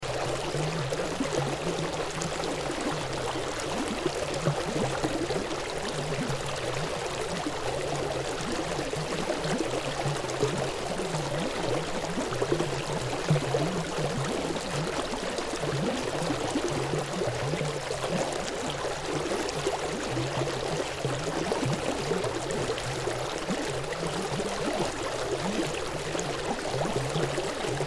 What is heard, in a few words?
creek